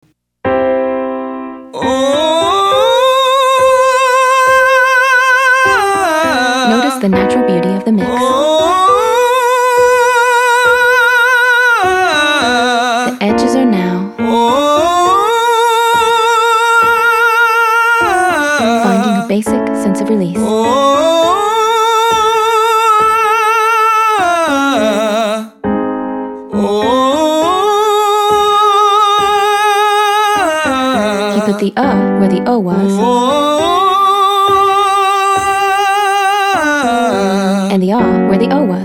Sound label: Exercise 4 males